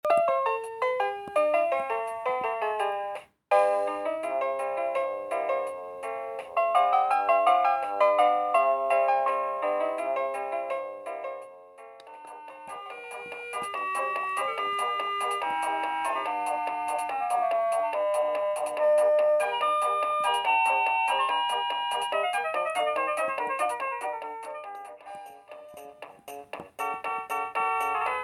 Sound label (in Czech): ukazka zvuku alarmu